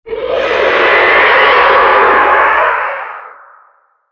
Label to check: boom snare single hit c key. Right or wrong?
Wrong